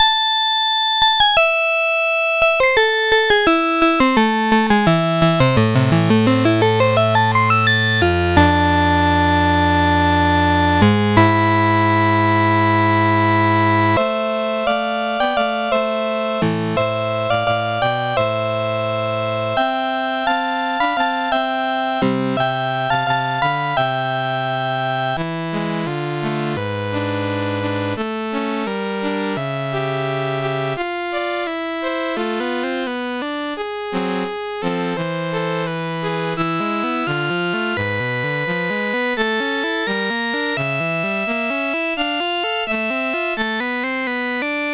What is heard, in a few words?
Concerto in A Minor, CSG8580R5